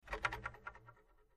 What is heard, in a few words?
Antenna; Oscillating Antenna Flicked Back And Forth.